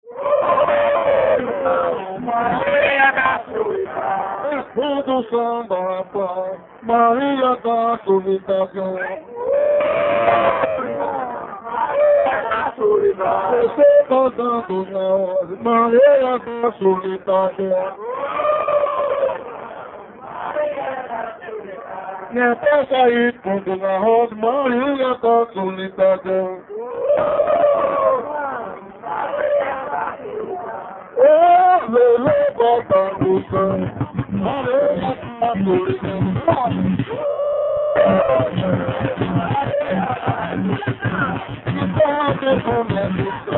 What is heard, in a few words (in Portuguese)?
samba de aboio